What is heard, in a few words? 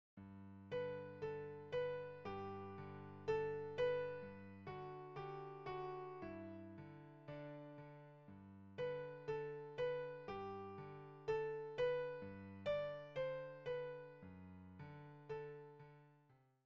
Arietta in G Major